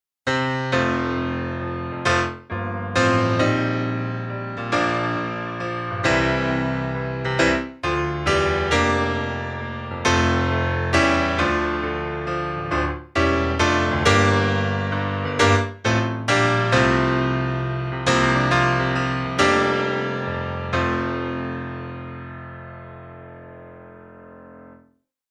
Bright C

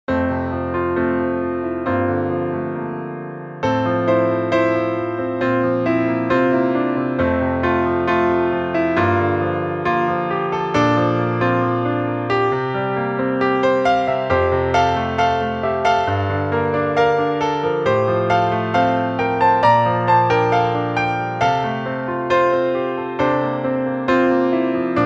Classical

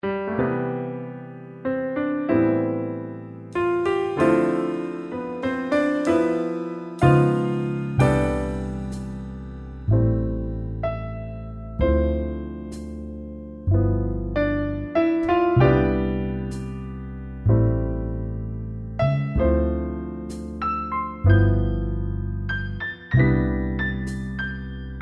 Piano Jazz Mix

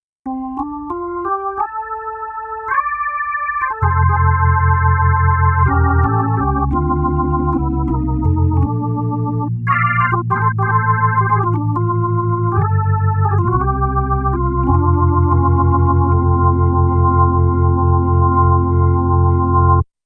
with chorus

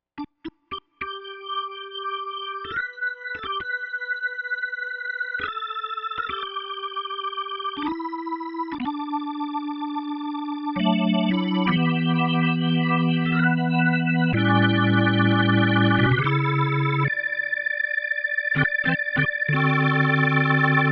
Gospel

